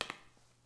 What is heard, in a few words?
lino, ambient; Shadowman